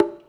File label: Conga; Equipped Music; Loungin House